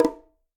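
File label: Bongo Low Flam; Latin Percussion; Drums; Drum Hits; Ableton; Factory Packs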